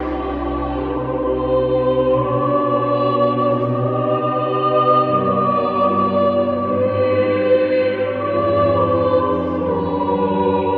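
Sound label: voice gothic choir; Sad; Loops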